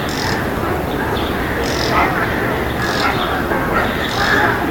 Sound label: bird audio